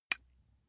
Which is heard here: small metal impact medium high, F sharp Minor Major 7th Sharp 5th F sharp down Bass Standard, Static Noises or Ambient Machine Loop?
small metal impact medium high